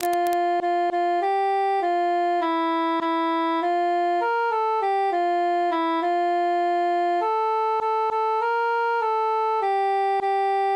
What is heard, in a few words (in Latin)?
cantus solo; matona mia cara